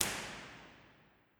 cathedral reverb

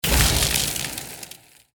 item unstable electrode skill

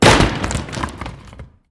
ceiling break

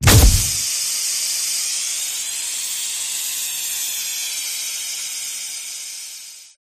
explosion smoke

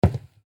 player walk wood loop; footsteps

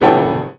piano hit

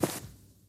frag grass

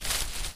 bush enter

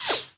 bullet whiz

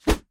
punch swing

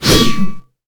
weapon axe cleave strong

